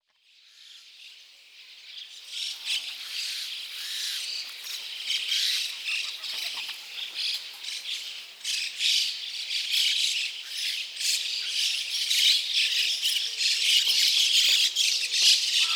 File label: bird